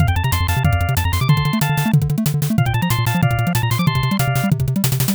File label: bgm